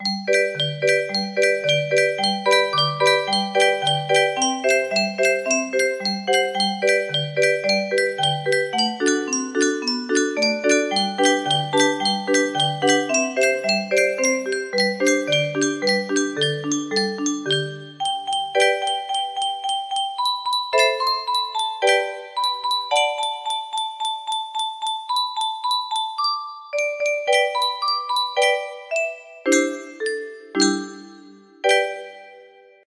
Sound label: music box melody, 30 note